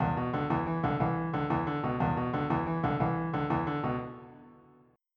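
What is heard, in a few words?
shuffle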